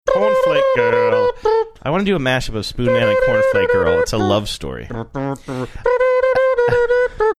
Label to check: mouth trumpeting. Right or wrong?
Right